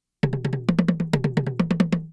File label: Toy Djembe Toms, Asia; Sonic Foundry (Sony Creative Software); Continental Drift Aftershocks